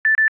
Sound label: Beep Beep